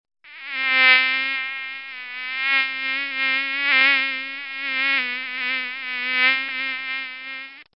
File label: mosquito tone